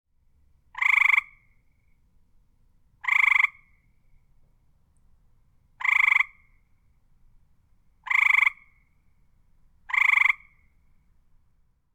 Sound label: Tree frog croaking in a small pond